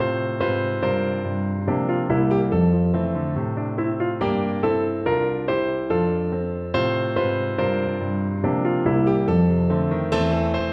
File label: Miniatures Gavotte; Bridge; Miniatures 2